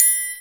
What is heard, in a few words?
TRI, triangles; Univers Sons; Basicussions